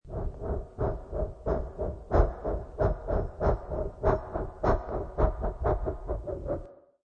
Fan

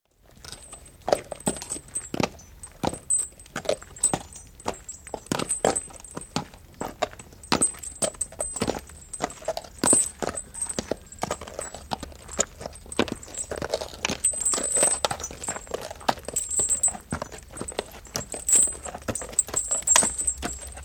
One horse walks along in harness only; Horse Sound Effects; Animals; BBC

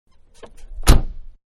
Car Big-Door Close-Exterior; Vehicles; Cars; Manhattan Production Music

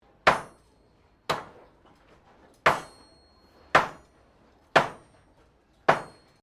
Blacksmith Hammering Sequence; Colonial era objects; Hammers (Sound Effects:Tools)

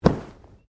Body movement Fall Medium

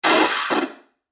Explosive White Noise; Noise Music

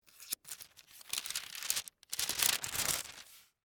Bible Turn Page, Book; Foley, Bible, Turn, Page, Book, Slow; BlastWave

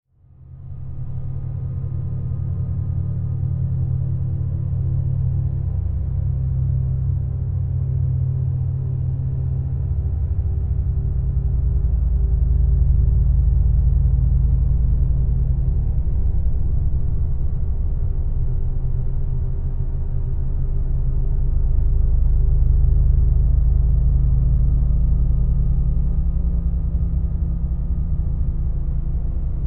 DRONE DARK HOR, Drone Dark Horror Synth; Blastwave

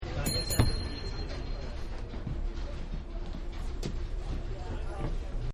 Board Cable Car, Streetcars; Sanfran Boardcablecar; Vehicles; Partners In Rhyme

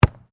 RUGBY BALL KICK; Various rugby sounds; Miscellaneous Sports; Sound Effects:Sports

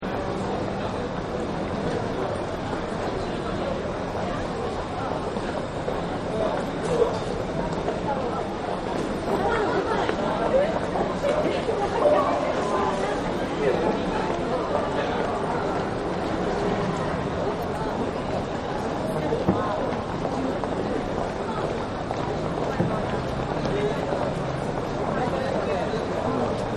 Street Ambience Tokyo; Cities; Backgrounds

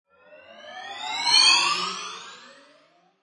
Ascend Slide Whoosh; Scifi Production Elements, Set 1; Lynne Music

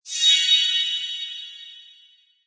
Magical Shimmer Pixie Dust; Magic sound of casting spell, conjuring or wizardry. Witchcraft ritual summoning elemental; Magic Sound Effects

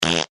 human fart; McKinney Sound

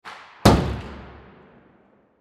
Hockey Slap Shot, Hockey Slap Shot Puck; Slam Into Boards Glass Impact; SoundEffects-Blastwave; Sports

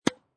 Tennis Hit Ball with Racket Medium; Tennis Sound Effects; Sports

